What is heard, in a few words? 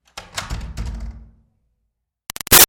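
Door Wood Church Basement Close Med Light; Miscellaneous Doors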